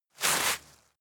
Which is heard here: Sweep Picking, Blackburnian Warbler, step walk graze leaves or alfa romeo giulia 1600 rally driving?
step walk graze leaves